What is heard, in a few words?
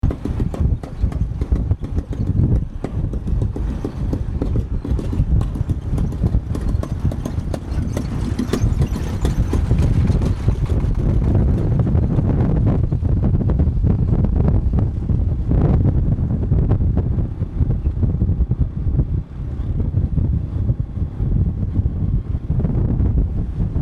wind tractor; Special tractor sound with wind; Vietnam; I recorded a lot of sounds in Vietnam